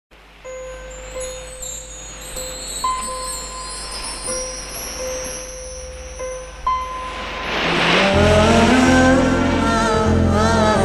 BGM Love; Duet; Prabhu; Meenakshi Sheshadri

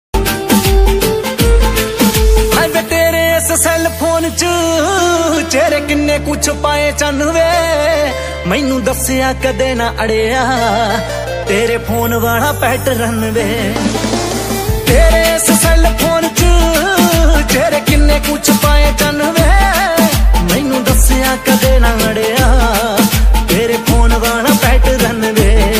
new punjabi song ringtone

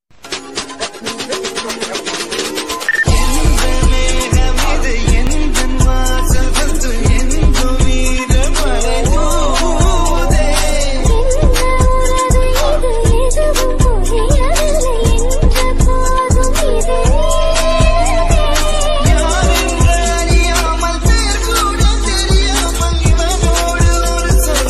song bgm ringtone; madarasapatinam movie

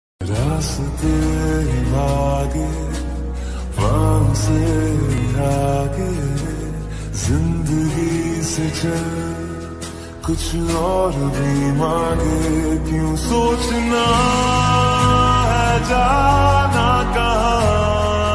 romantic ringtone

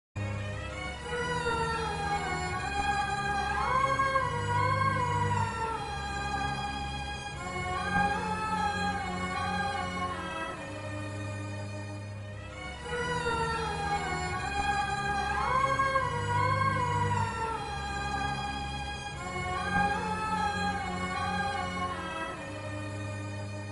anniyan sad bgm; anniyan alone bgm

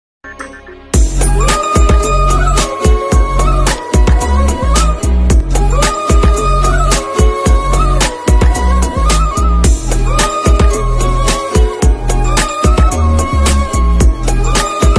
love bgm ringtone; sandakozhi

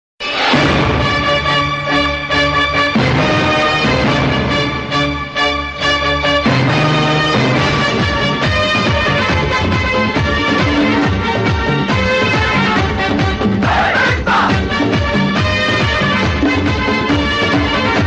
telugu movie background ringtone; narasimha

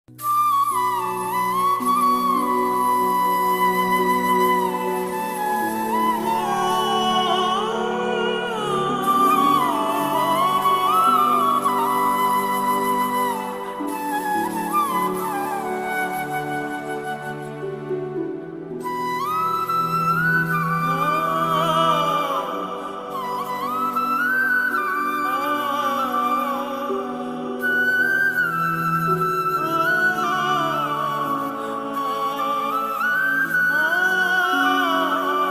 call tune ringtone full sad bansuri; khuda aur mohabbat season 3

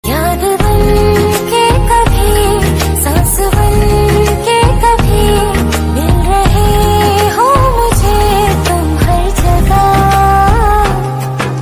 female version love sad song hindi ringtone

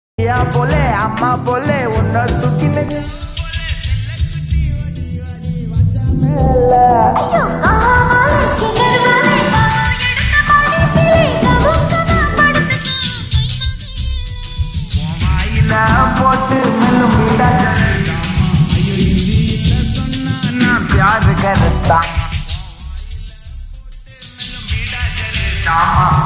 bgm ringtone; raayan

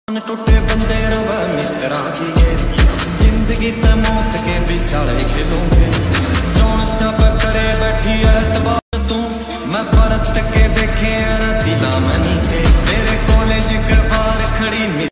haryanvi song ringtone

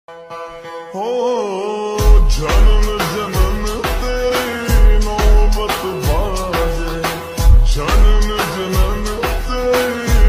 heart touching ringtone

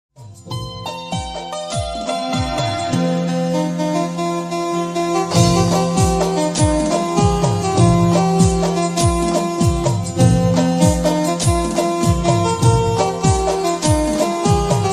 new gujarati ringtone music

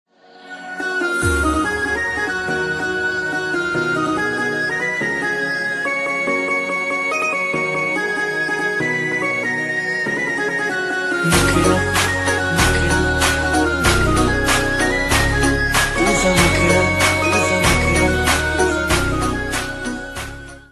marathi ringtone

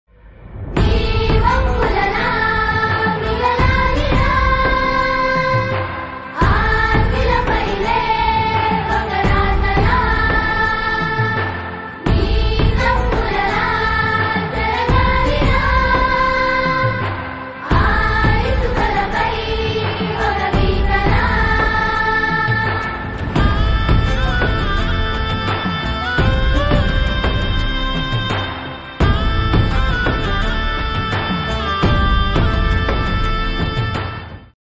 ringtone telugu; salaar

